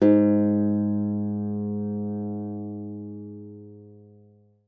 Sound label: G#2, GUITAR; Xsample VOL-2